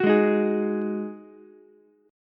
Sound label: D sharp Minor Double Flat 5th, Ukulele; strummed; chord